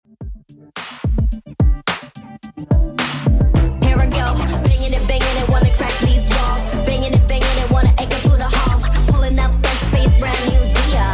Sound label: Top40 Redrum 108 bpm